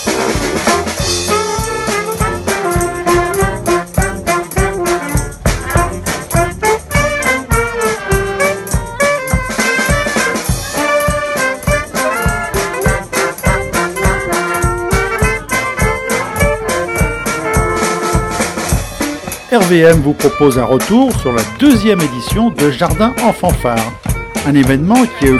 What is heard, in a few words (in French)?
jardins en fanfare